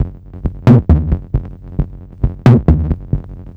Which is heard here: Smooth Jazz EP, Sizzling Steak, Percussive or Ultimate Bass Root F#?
Percussive